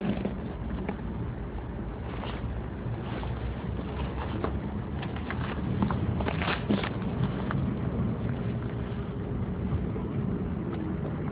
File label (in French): ambiance sonore cours college; saint yrieix la perche